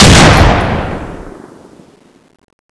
shoot

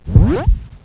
bomb deploy; heartbomb

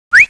delay whistle

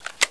svd clip out

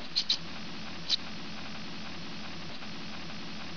rat, ambience

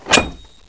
knife dragon draw

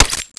smg clip out

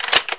trg clip out

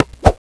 katana stab

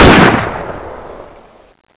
mg36 shoot, weapons

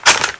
bazooka clip out

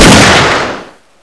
shoot

